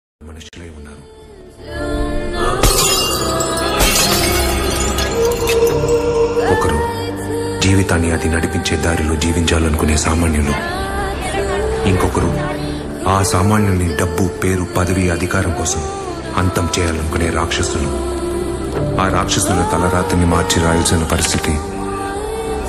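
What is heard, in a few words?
background music ringtone telugu movies music ringtone; saamanyudu; new movie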